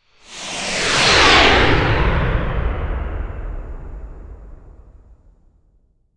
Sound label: Missile flyby deep sonic whoosh; artillery